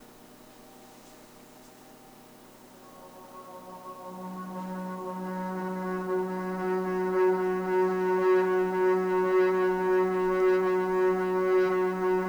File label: Ambience; Ambience for April